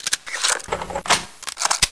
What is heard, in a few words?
sniper reload